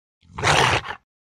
Dog, Rottweiler Growl, Snarl. Single Low Snarl / Growl. Close Perspective.